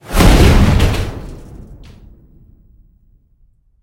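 tyazhelij grohot ot grudi starogo metalla, heavy rumble from a pile of old metal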